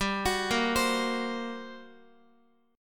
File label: G Minor Major 11th, G, Guitar; GmM11 arpeggiated; x,x,7,11,10,8; JGuitar